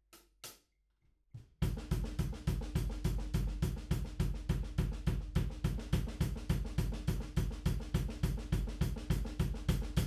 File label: DRUM